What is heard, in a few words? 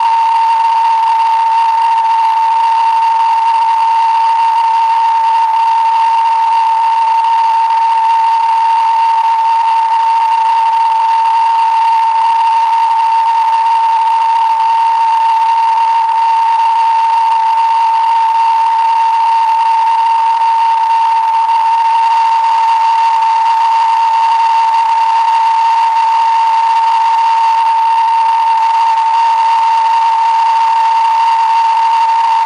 signal interference; sonniss gdc